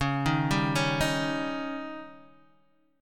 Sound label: Db Suspended 2nd Flat 5th, Guitar Open D